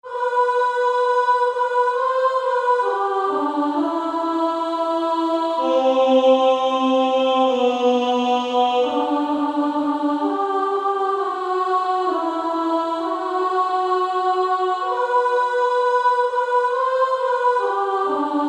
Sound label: Female Choir